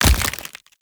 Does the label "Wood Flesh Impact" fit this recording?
Yes